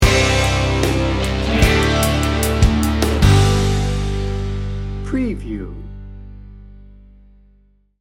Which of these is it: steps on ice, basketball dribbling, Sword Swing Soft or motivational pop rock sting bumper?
motivational pop rock sting bumper